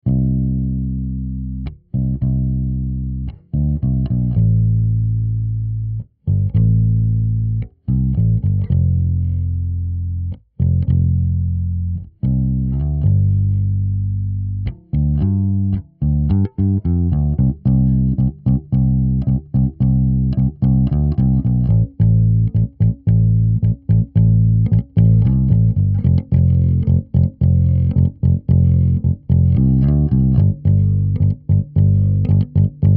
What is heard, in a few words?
Sonic Farm Creamer SE Test Bass DI Ampeg Sim